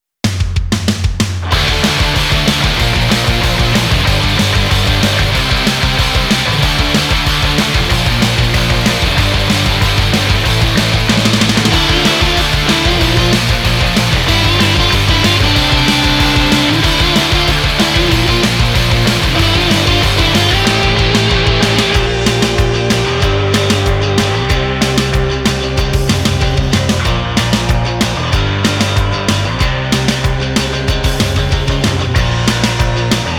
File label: Noise Rock